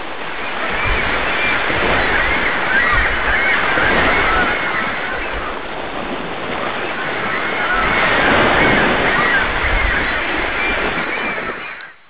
ocean gull; windex

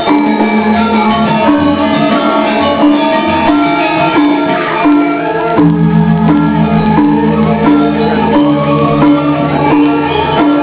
gamelan

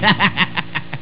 laugh

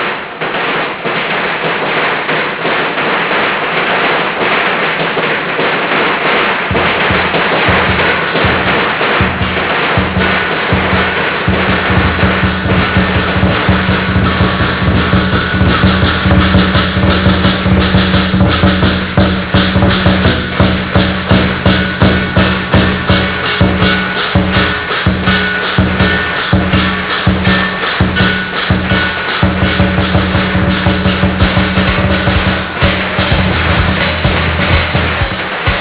chinese new year; ChiZodiacsigs

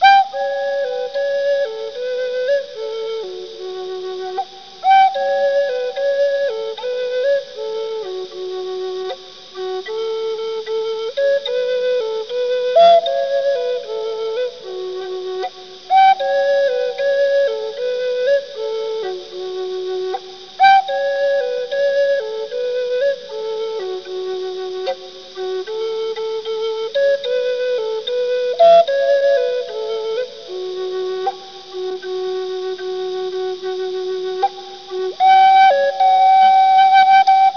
flute

